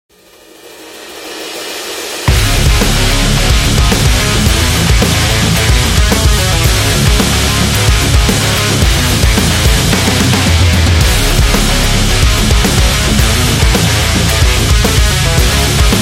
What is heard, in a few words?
Metal Hard Rock